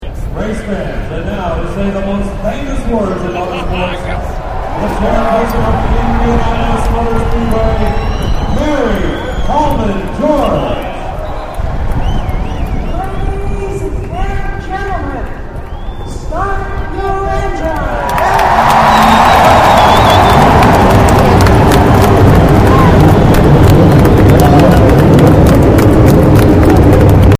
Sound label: start engines; indy